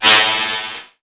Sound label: SOUND EFFECT, Metal; AKAI S6000 CD-ROM - Volume 1